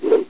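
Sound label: axe blade; Hexen2; paladin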